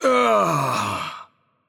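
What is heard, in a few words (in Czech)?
Death, Czech; cs CZ; VO; bloom minigame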